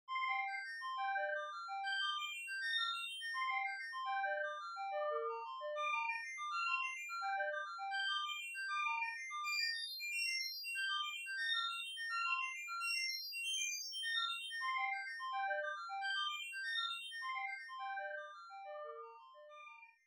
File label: pigmie scale tune; tunesmithy; csound